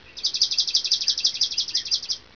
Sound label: night, forest